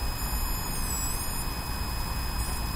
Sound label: pitch slide